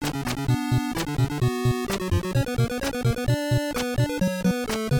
alla turca; lemmings

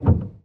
piano

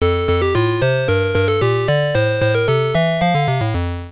stage clear; popeye

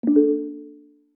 warning

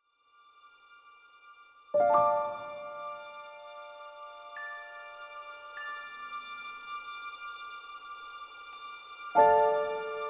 dramatic, bgm

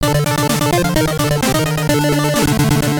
boss clear; dracula

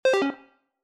scan error

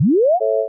pad, retro synth; agentmag

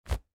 flag

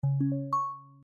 process complete

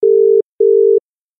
ringing

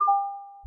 beep

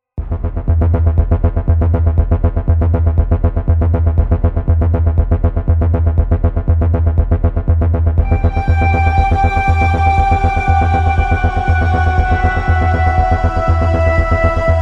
sad, bgm

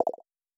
Pop

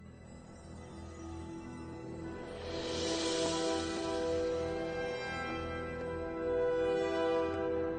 theme; matrix

